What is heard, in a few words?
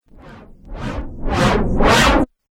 Riser Abrupt Stop Flanger; Rise And Dive